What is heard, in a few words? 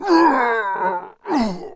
dead, monster; gupae